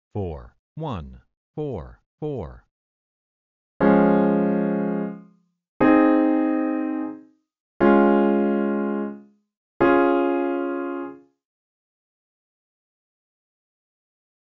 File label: I, IV, progressions